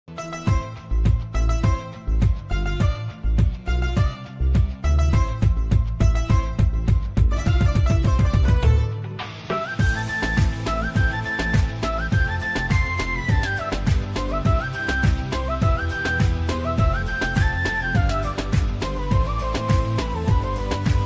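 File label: Telugu background music ringtone; bommarillu; genelia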